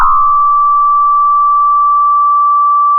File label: pop, Sound of a church bell